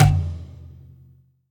Percussions

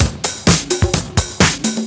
Straight Loop 128 BPM, House

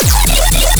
bass hit madness E; Virtual Riot

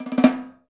Small Snare Phrase, Roland BK-5; Snares

